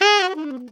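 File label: alto short fall G#3, saxophones; Greytsounds - Philip Wolfe David Crozier's Saxophones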